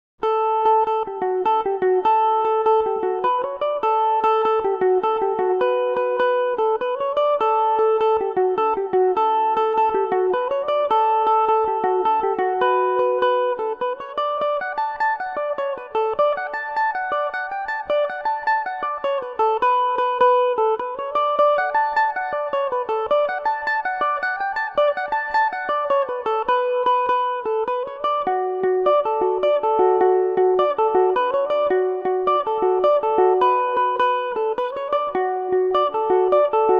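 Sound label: D major revelation, Irish mandolin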